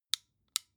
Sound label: flashlight off slow